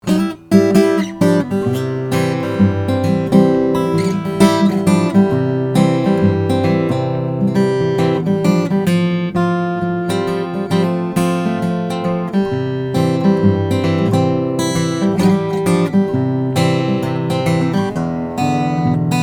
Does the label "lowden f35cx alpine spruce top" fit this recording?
Yes